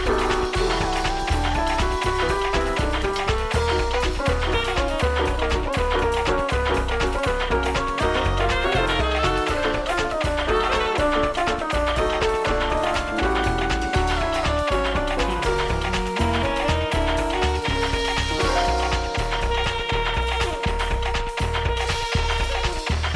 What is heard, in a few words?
Piano Black; Cowboy Bebop